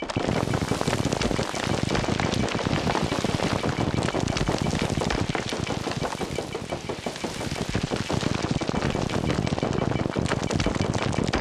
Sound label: crusher loop; Factorio